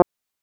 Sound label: tuba